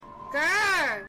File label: grr